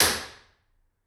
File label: Hybrid Early Reflections The Carpentry, Impulse Responses; Ableton Live 12 Lite